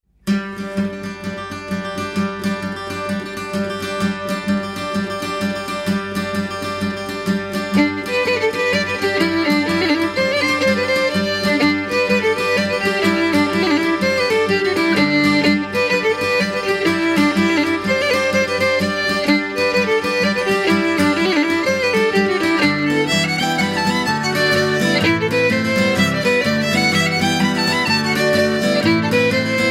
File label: Jig, Irish melodies